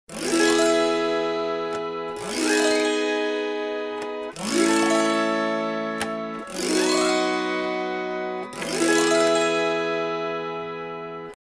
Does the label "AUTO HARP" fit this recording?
Yes